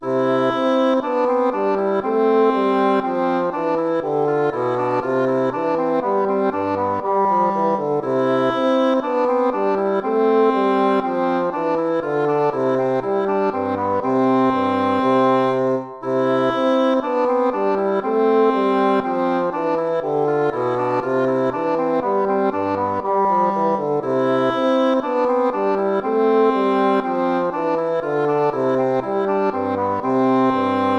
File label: allegro; hook james